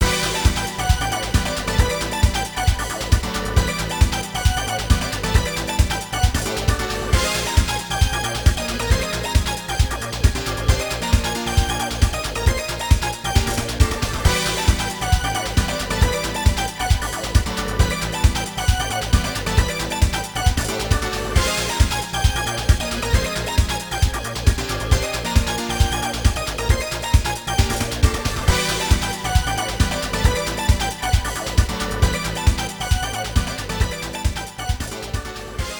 Goal; Scud Race; arcade

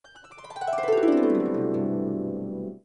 harp gliss; dreamcast; Dragon Riders; Chronicles of Pern

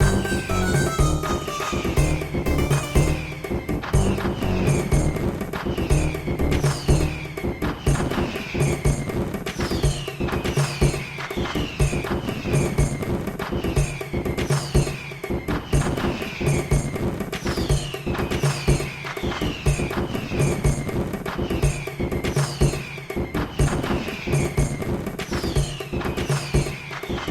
Level Pack Complete; Bricks of Egypt; macOS; Windows

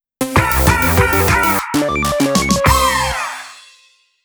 Victory! – Jingle; Splatfest; Splatoon 2 Original Soundtrack; Splatune2 2017

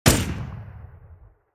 Vickers Shot

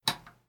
Flicker

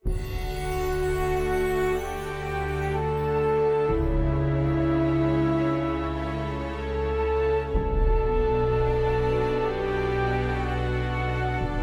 Cinematic Music

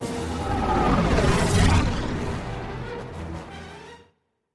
Cutscene; lego star wars

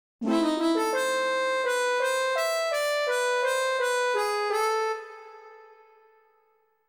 Harmonica; tales of eternia remastered audio

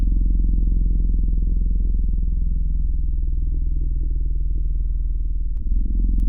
Burrowing Growl; Lethal Company

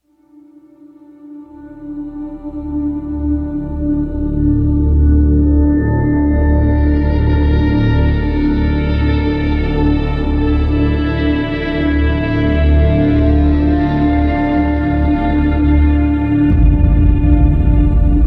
burning intro pad; call of duty modern warfare 2

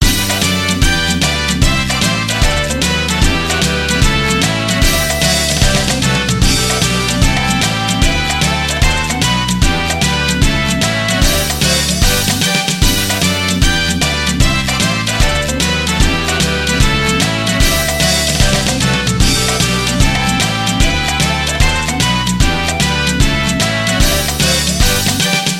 Congratulations!; Donkey Kong Barrel Blast; Wii

